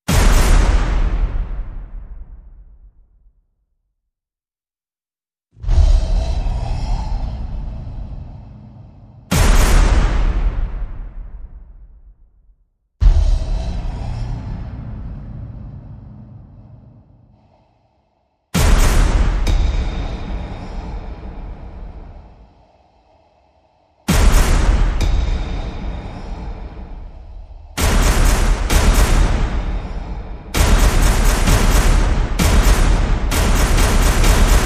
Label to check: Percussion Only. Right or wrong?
Right